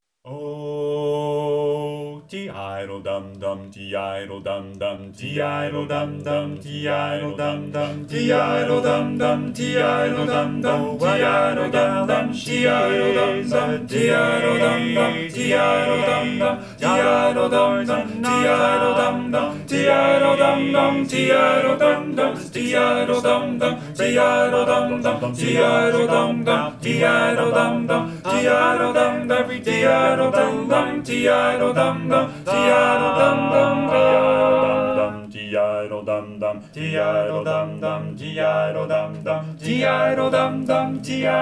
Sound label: tenor right; 2024 spring show